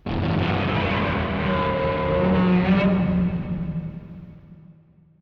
standard roar; Godzilla '50s

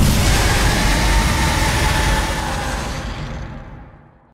Roar; Diablos

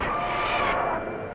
Gigan Rex Roar

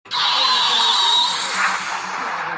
roar; Zarigan

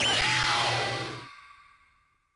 Roar; Aqua Mothra; Heisei

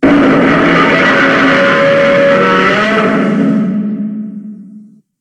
Deep Bass Roar; Godzilla 1954

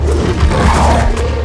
canine roar

